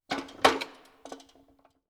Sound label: Metal Trolly; 99Sounds; The Warehouse